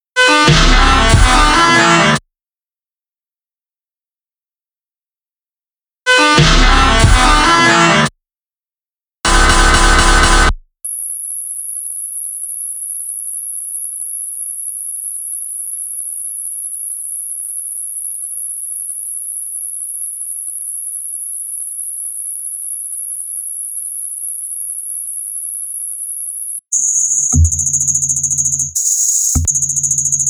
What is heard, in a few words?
twist and noise